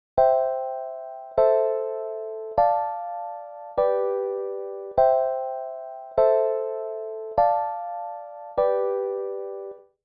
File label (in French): ligne accords sans renversements, basse; exercices